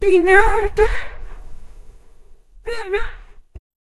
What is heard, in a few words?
jingle